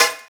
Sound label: SD, Drum & Bass, 160 BPM